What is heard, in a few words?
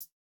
no effect j city pop 1980 hihat